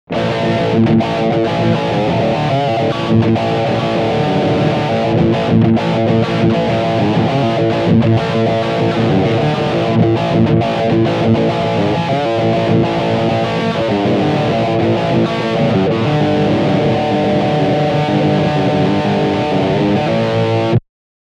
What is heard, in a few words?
Riff Distortion Bridge Humb